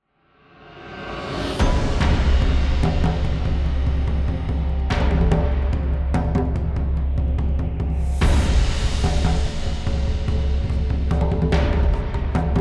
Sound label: epical drums, cinematic